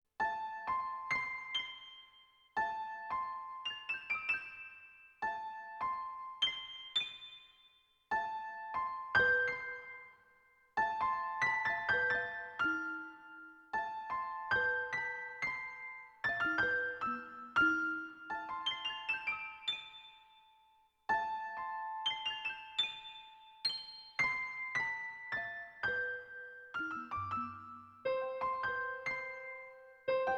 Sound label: piano